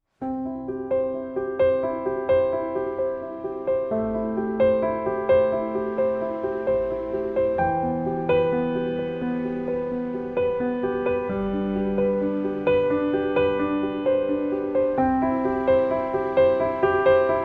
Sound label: amazing wedding full orchestra